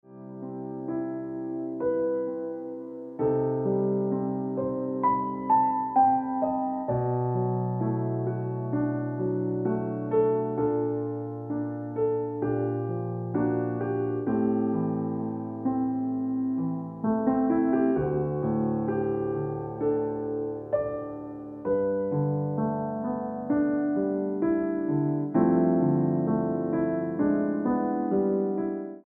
Ringtone Piano Music

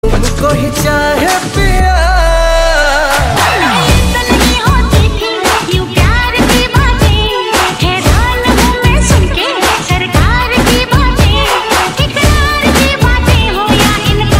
Ringtone

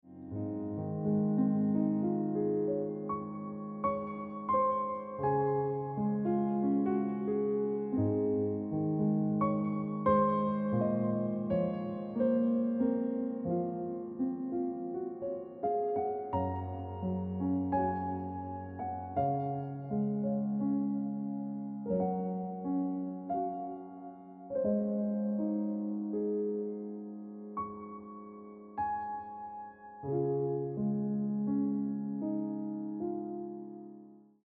Ringtone Piano Music

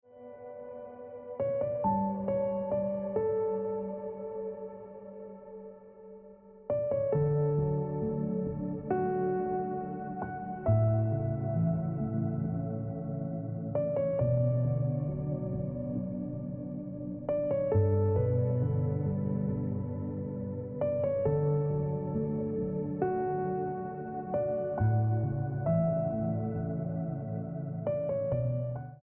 Ringtone Piano Music

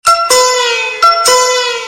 Ringtone

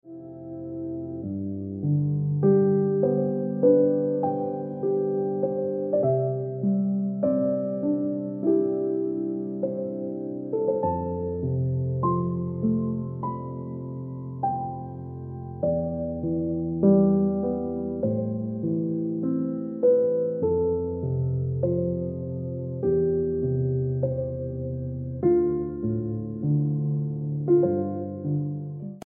- Instant Ringtone Piano Music

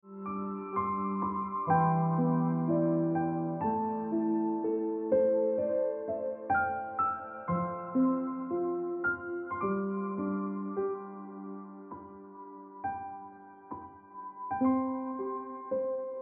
Ringtone

